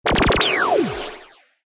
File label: consecutive impact, mahjong; ボードゲームやカジノ、麻雀の効果音です。